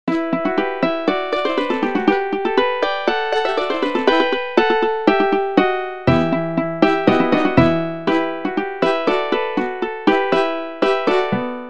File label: polka baroque